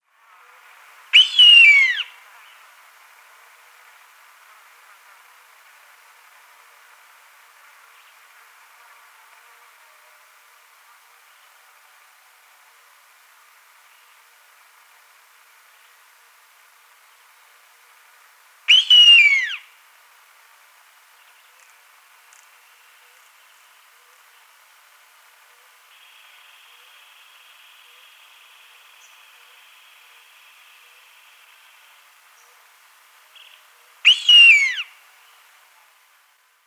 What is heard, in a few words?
Buteo nitidus; Kamba Aka